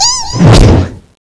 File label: attack; Teddy CSO; cstrike